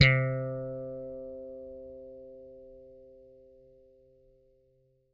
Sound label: PLUCK C3, Bass; Good 80 Pluck; Bass Mace; DirectWave